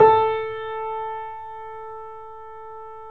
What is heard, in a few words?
3D Pianos Yamaha C7; E-MU Producer Series Vol. 5